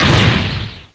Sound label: wham